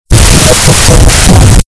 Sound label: android notification